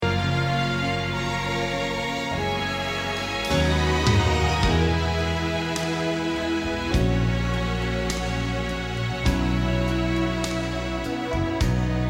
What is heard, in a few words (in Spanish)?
Bajo